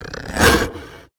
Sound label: Faerie Dragon Attack